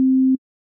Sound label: Sine Saw Square Sine, Synths; Multisamples; Ableton Live 12 Lite; Core Library